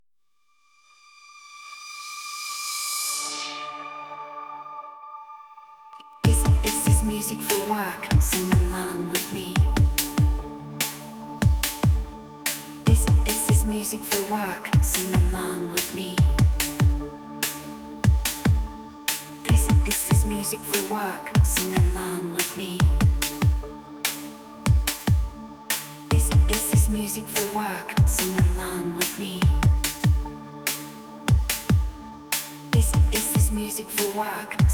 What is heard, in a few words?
French Electro